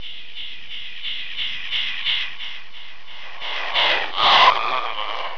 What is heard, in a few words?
monster growl